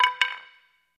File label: Staff Drop To Ground, spears & staffs